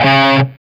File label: D 4, Soldano rock guitar; Spectrasonics; Hans Zimmer Guitars VOL-1